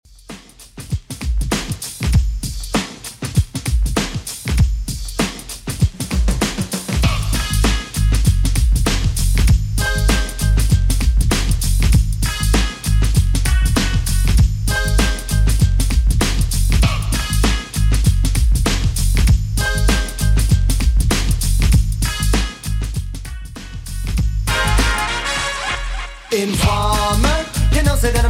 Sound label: 90s Redrum 98bpm